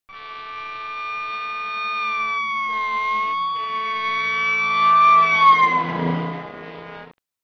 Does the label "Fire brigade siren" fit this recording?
Yes